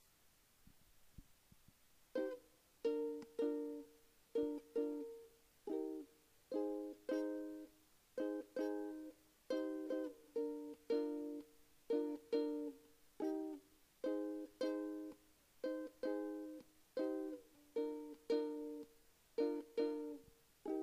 Ukelele

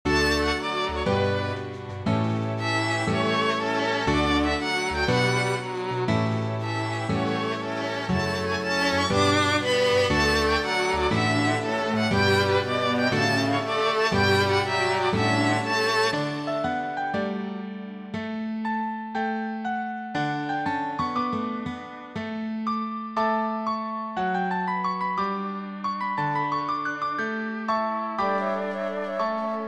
anthem

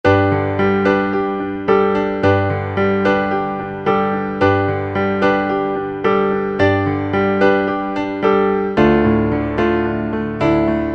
pianoman

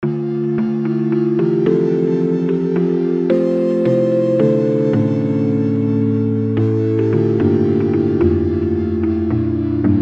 guitar synth

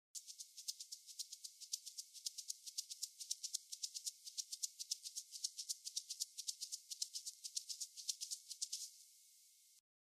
Maracas

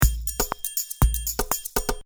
Loop

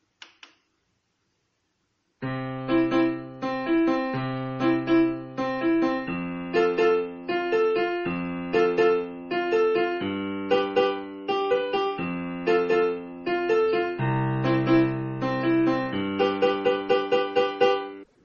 Piano

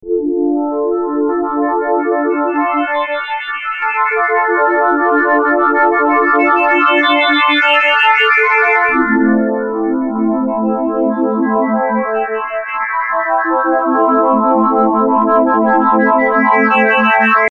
bassline pad Dm Am 8 bars

